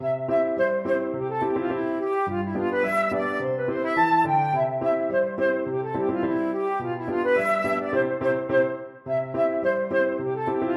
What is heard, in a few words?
schottisch flute